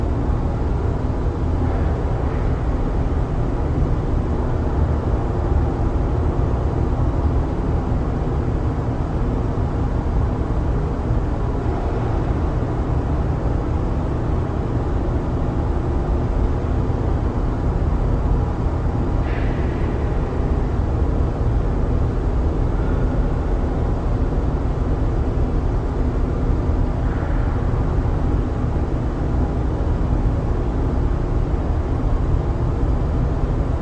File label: Hum Rumble distant noises; Inquisitor 2